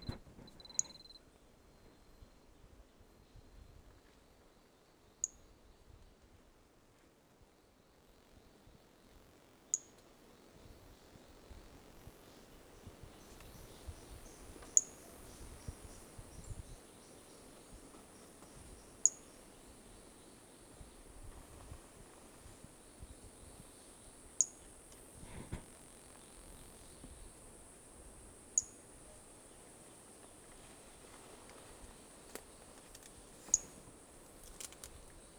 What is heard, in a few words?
Brachycephalus mirissimus 3-4 initial notes missing; L F Ribeiro